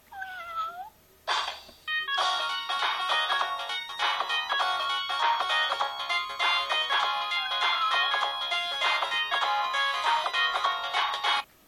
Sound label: samsung sghe700 ringtone